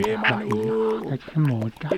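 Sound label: Music Village Calm Inside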